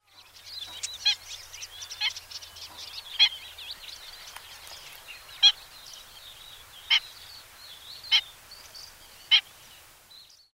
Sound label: black winged stilt